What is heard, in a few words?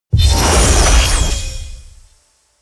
sci-fi click choose character, hits impacts